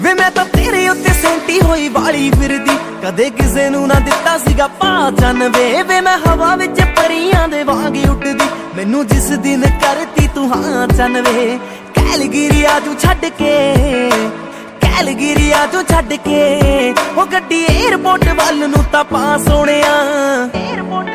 punjabi remix ringtone

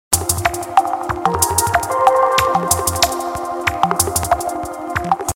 3d ringtone; sony xperia x10

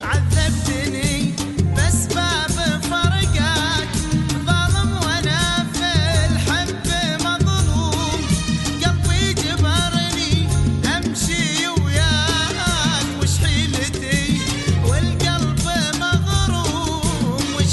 arabic ringtone

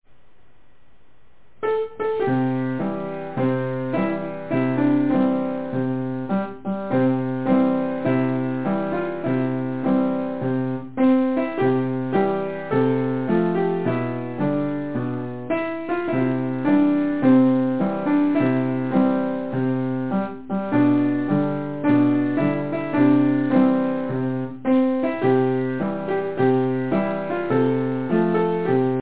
piano happy edition ringtone

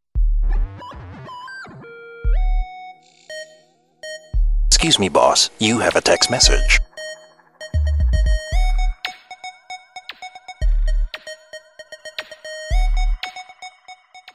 sms ringtone

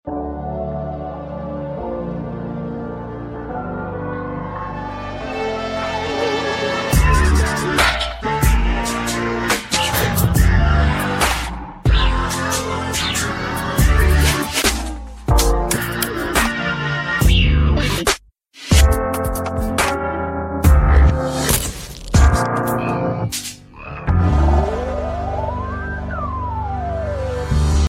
galaxy s ii tone ringtone

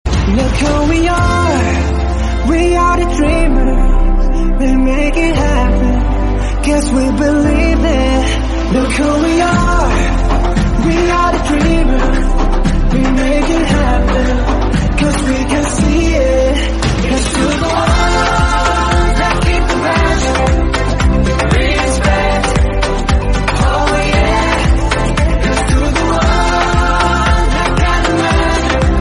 english song tone ringtone

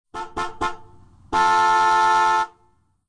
car honk ringtone

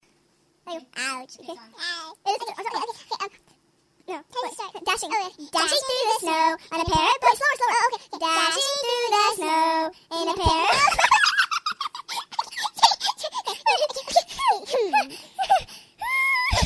funny jingles ringtone

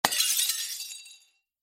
glass break sms ringtone

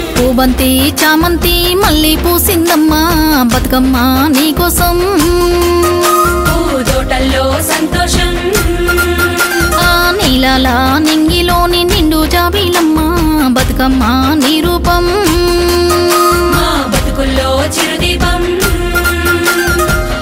telugu music ringtone

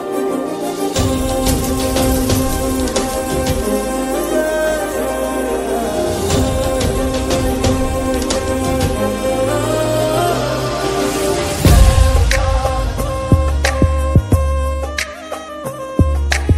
call notification musical tone ringtone

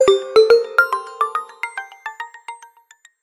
htc dahlia ringtone

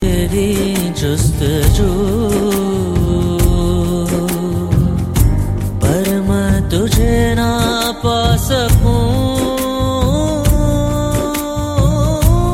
sad tune ringtone

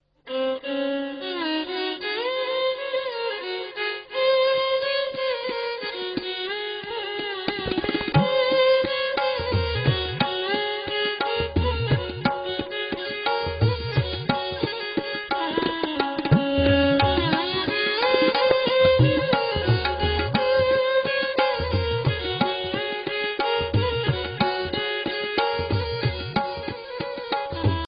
sad violin ringtone

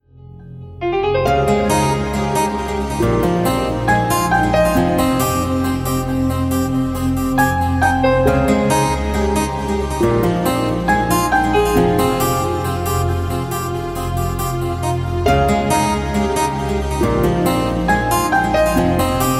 ringtone, english ringtones

